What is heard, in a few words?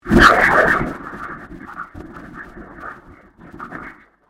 Convolution Surge